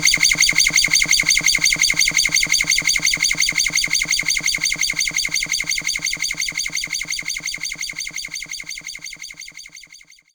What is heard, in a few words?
Fret Slide